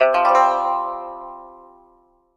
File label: Music Hit Ethnic, orchestral